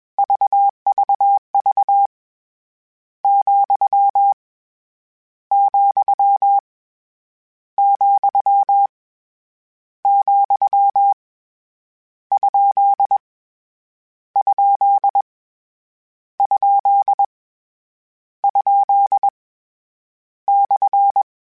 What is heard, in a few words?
Morse Code, CW Code Groups; Mixed